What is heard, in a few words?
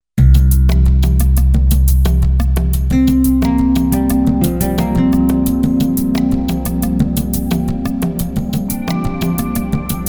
Nylon Dreams